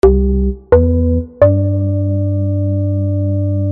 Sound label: organ